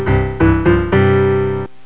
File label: PIANO